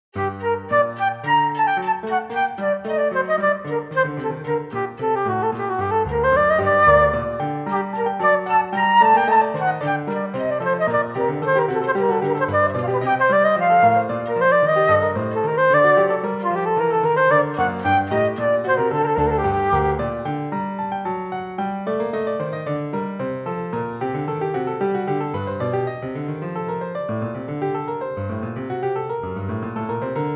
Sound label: Sonata for Flute